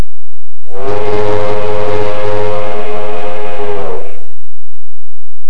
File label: steam